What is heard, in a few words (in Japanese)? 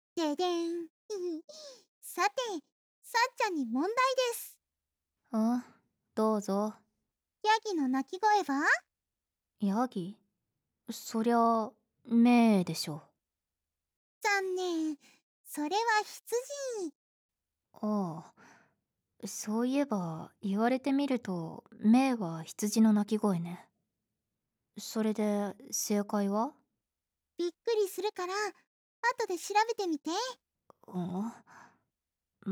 鳴き声